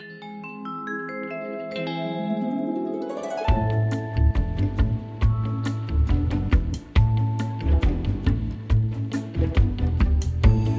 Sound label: BGM Title